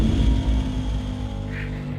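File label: Music Volcano 120; From Dust; ps3; xbox 360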